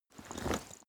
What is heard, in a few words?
leather metal movement soft single